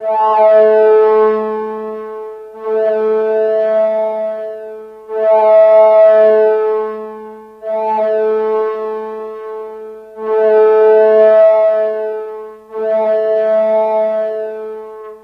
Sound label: Scanner Whirls; scanners